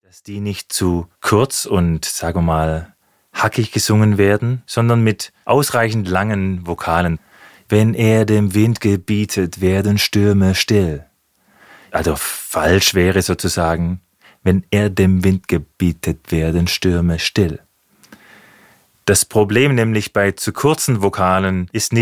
Rhythmus

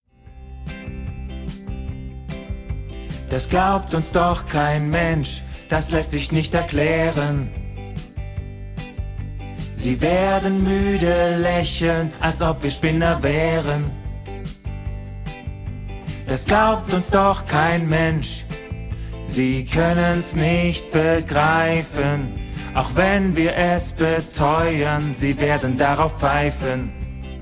Lern Bass

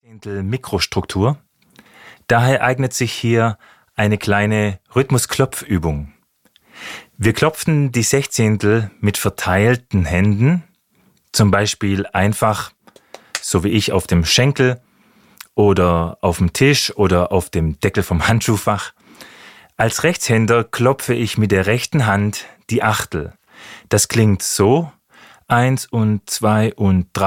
Rhythmus

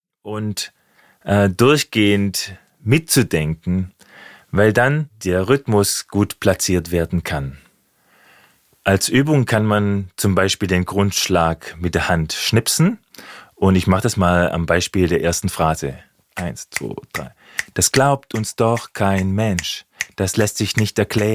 Rhythmus

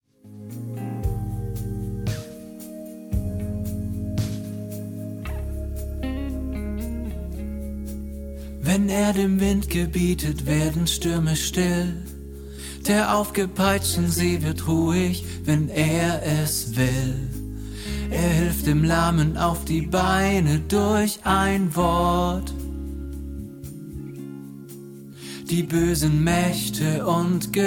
Lern Tenor

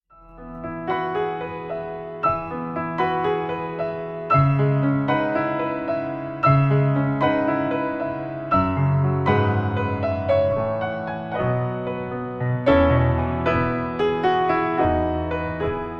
Piano Meditation

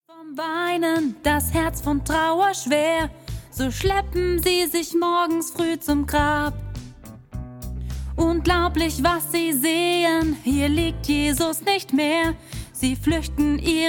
Lern-Sopran1 und Solo

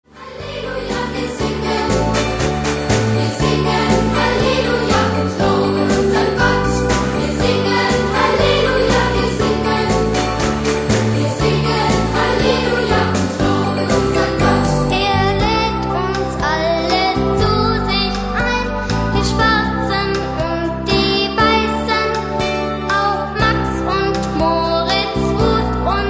Wir singen Halleluja

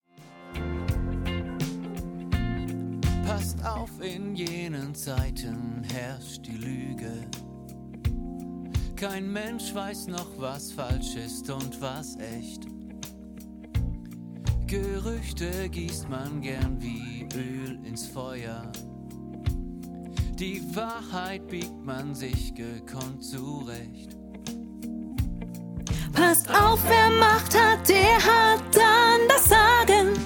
Lern-Sopran

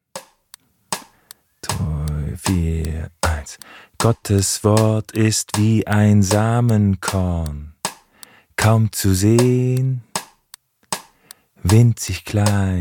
Rhythmus

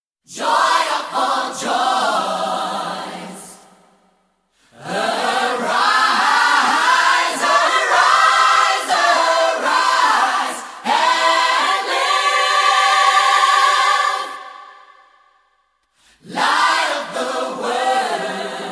Studiochor; Janz Team

